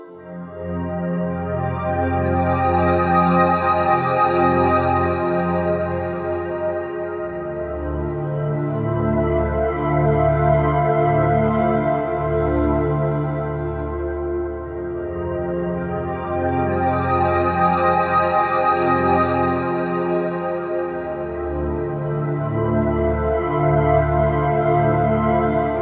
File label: Dreamy Game Menu Looping, fantasy